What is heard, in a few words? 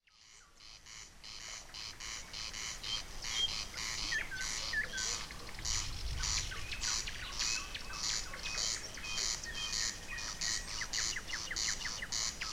estonian night; jgrzinich